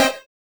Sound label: Synths Root C